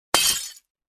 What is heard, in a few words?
Glass shards smash hard impact